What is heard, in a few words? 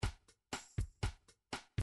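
midi drums salsa latin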